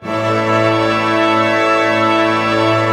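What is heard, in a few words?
pentatonic A fortissimo, orchestra; Sound On Sound - Peter Siedleczek's Orchestra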